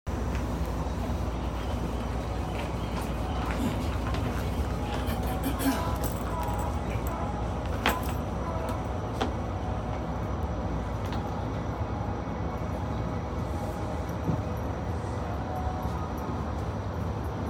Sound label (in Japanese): JR南武線登戸東, train sounds